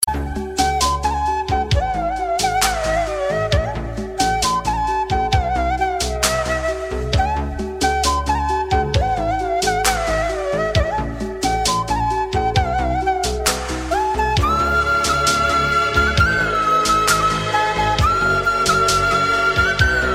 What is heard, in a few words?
light music